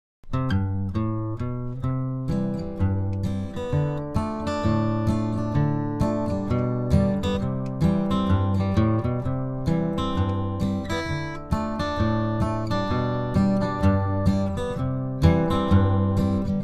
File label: instrumental; Drama Notebook; elementary music curriculum; songs for kids